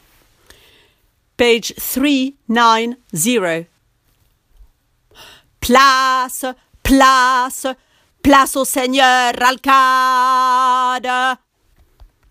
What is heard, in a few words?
bas; Carmen